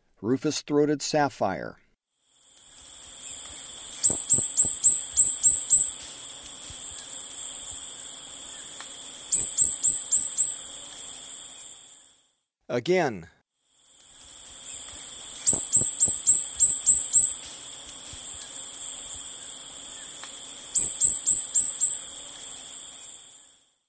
Rufous-throated Sapphire; S. America; Eastern Lowlands

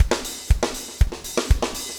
4 on floor 120, loops, 120bpm

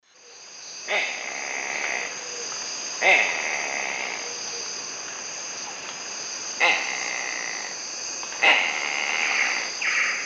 Busarellus nigricollis, calls; S. America; Eastern Lowlands

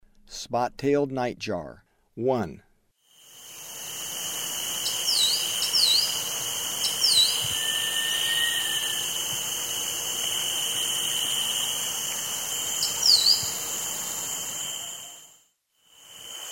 Spot-tailed Nightjar; S. America; Eastern Lowlands

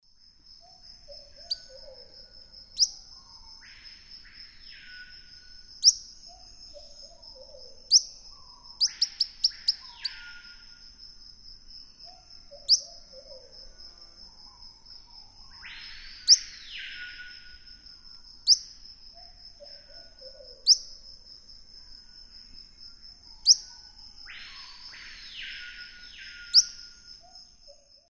Myiobius barbatus, calls and subsong; S. America; Eastern Lowlands

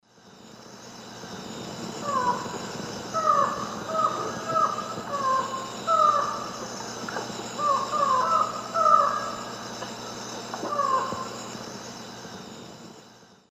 Micrastur gilvicollis, duet; S. America; Eastern Lowlands

